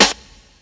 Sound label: old school rich top end snare f key; snare drum samples